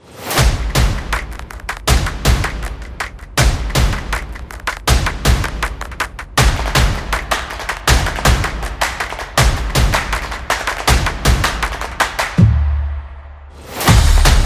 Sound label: The Sports Beat